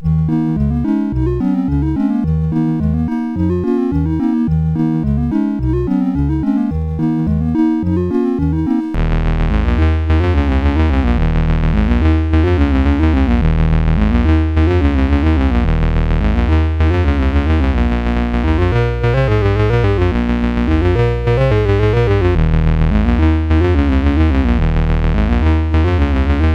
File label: Soundwave Tubular Bells